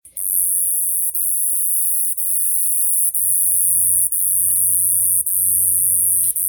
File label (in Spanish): saltamonte, sonido de insecto; Esperanza